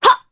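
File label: Vox Jump Japanese; Naila; kings raid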